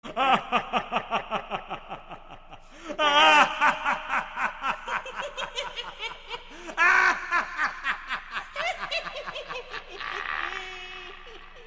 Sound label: Laughter Devil